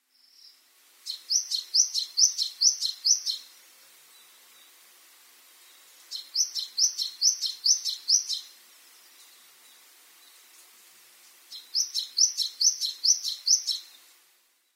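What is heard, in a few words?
Amami tit; amamishijukara; koe; yanagawa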